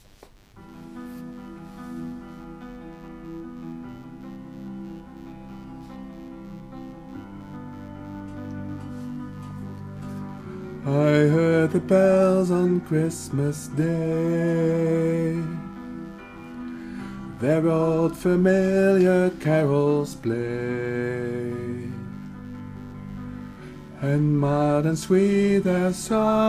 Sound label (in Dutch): Sopraan Laag; I heard the bells on Christmas day